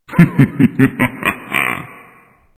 mortal laugh

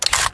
m4a1 clip release; items

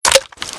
close, 9mm AR; weapons; halflife

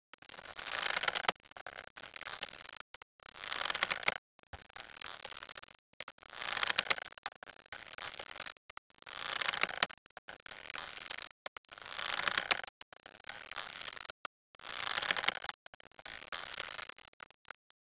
hang rope

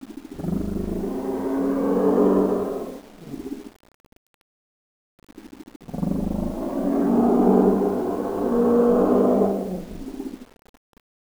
growl beak

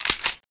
usp slide back; weapons

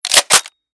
bolt pull, 9mm AR; weapons; halflife

